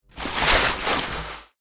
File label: beer truck hose spray tap; sfx sports duff